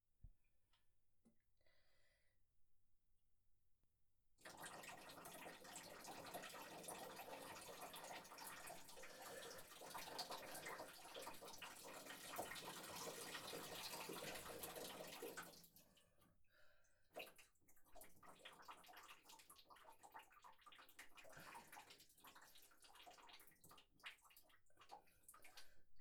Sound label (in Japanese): お風呂で オシッコですッ